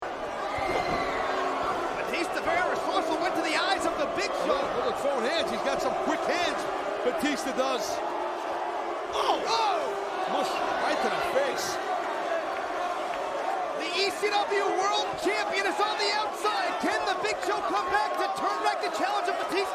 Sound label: more booing; big show vs batista ecw